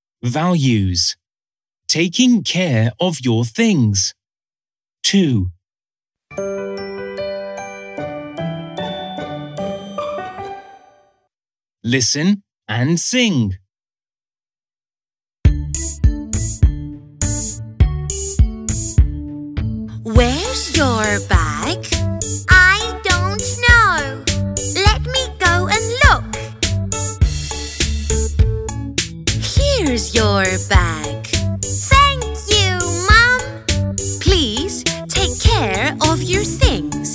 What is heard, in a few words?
chant; values